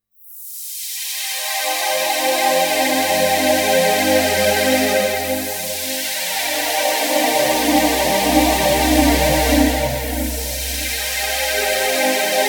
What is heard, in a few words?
Roland Gaia2 Pad